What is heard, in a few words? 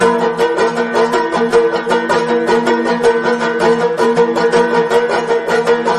banjo; music